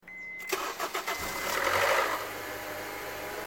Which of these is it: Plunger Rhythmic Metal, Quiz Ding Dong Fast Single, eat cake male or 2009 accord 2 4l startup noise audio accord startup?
2009 accord 2 4l startup noise audio accord startup